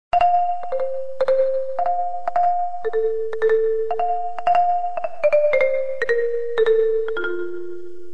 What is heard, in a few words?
sonatina music